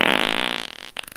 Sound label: big fart